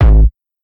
reverb bass drum g sharp key; kick drum samples

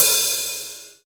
big open hi hat sound D# key

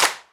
hand clap sample g sharp key; single hits one shots

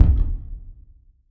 rich low end bass drum single hit d sharp key

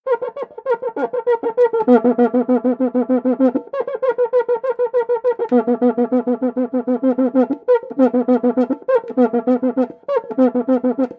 aluminium cuica sound; percussion; loops

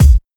kick single hit c sharp key; kick drum samples

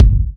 tr 505 kick g sharp key

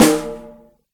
big acoustic snare sample d sharp key

